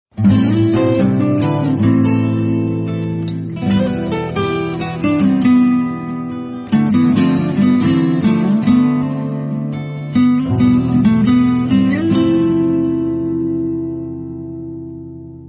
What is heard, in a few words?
Trending Guitar Ringtone; ringtones